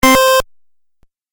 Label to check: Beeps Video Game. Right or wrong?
Right